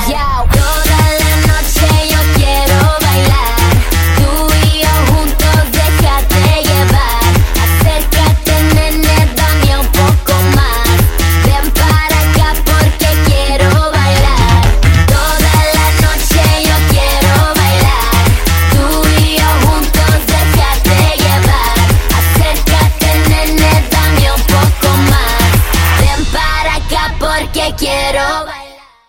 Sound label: Spanish